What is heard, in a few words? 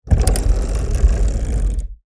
Operational industrial gears squeaking